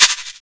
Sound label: tambour, tambo shaker